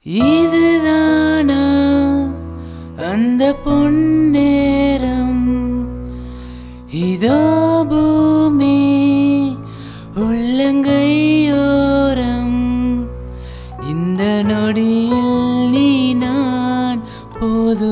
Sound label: Unplugged